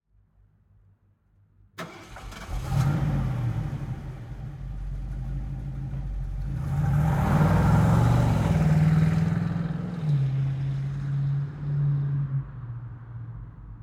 Mustang Mach1 EXT fast start away pass by pull up reverse revs, 1970 Ford Mustang v8; aXLsound